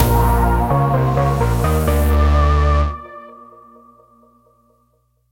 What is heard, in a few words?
stinger win; steamcommunity; saliengame